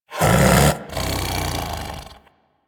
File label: Snow Critter Vocal Snore; Warframe; Hunting Critters